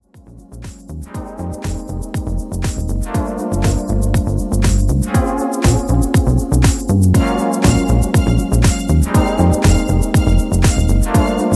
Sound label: New Funk